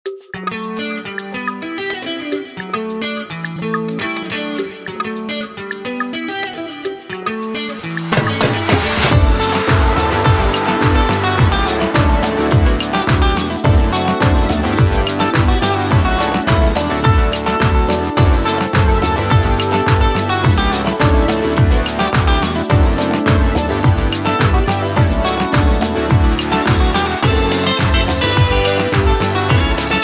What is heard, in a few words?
Backing Track; Sand Point Elementary; Classroom Teachers